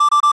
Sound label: System pipipi low